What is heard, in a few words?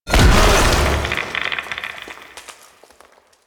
Half Life Impact; Warframe; Warframes; Qorvex